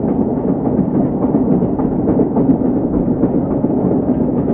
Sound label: train wheels overhead loop, machines; Half Life Model Pack; Half Life 2